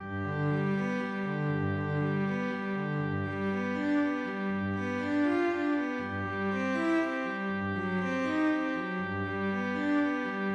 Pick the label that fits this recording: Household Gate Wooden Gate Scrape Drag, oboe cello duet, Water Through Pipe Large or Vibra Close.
oboe cello duet